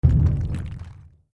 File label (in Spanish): cae al suelo